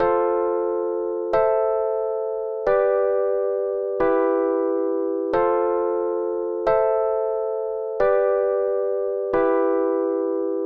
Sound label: Chord Basic 1 6 4 5